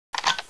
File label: sniper reload, weapons